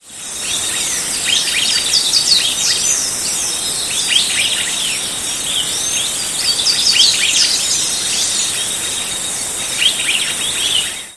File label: Myiodynastes maculatus; PN Itatiaia; Silveira